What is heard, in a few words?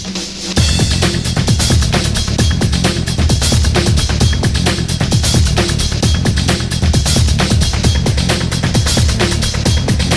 club; svencoop; dark seekers